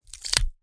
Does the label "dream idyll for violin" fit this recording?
No